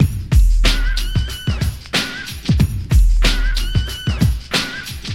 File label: Hip-hop, drum loops, funk